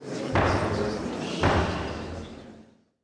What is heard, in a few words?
court; PHARAOH